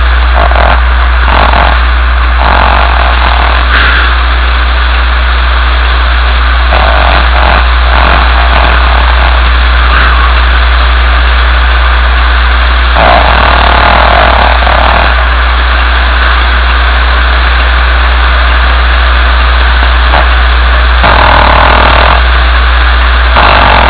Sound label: snoring